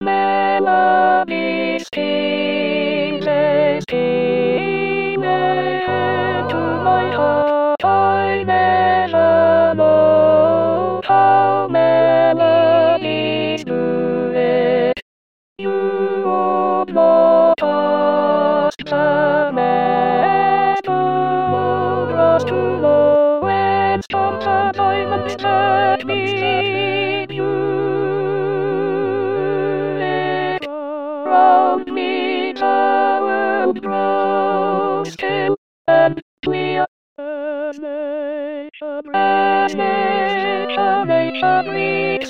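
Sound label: alto; Dvorak; Songs of Nature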